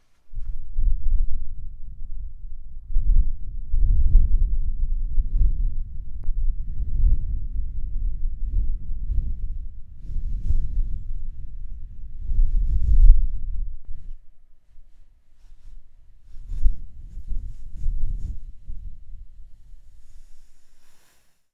Wind Noise Only

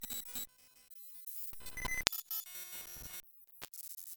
Sci Fi Computer Working; A Whole Cd In 4 Seconds

